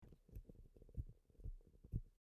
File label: Hand Lewitt MTP 340